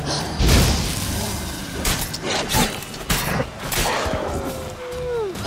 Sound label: fight; The Witcher 3